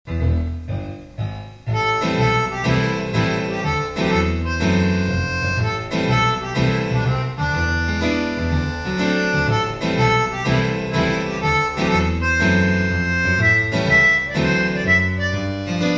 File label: jazz, bandoneon